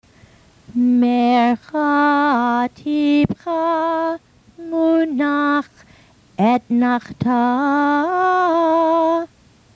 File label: Haftarah Mercha Tipchah Munach Etnachtah